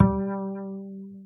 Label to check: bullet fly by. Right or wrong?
Wrong